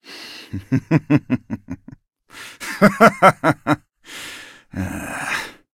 laugh, zh

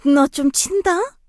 hurt vo, kr; melodie

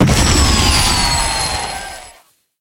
explosion; chester

